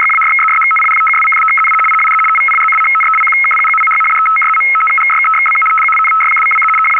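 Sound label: radio teletype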